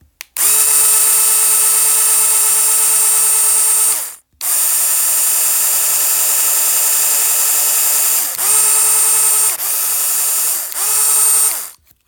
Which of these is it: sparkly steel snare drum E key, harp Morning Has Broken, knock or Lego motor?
Lego motor